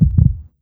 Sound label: Player UI; Five Nights At Akers; soma